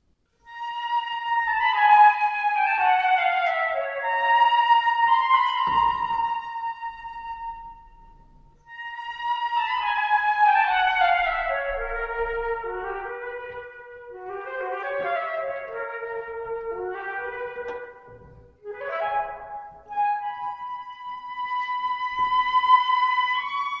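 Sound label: debussy syrinx solo flute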